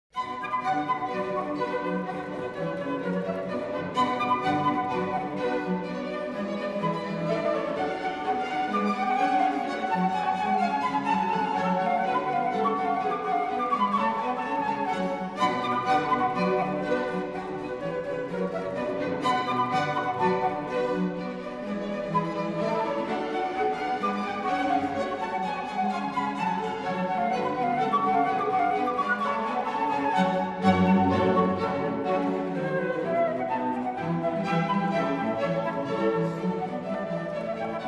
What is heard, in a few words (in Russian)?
КАМЕРНЫЙ ОРКЕСТР; КЛАССИКА